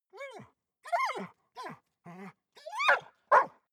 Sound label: dog bark whine; natur-tiere; hunde